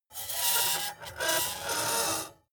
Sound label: metal screech phaser edgy short